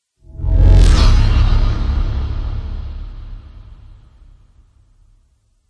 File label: spaceship fly by; george gazetis